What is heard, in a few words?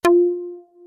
got gem; KevinBlast